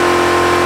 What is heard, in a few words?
Mustang67 rpm, Engine; Cars; XBOX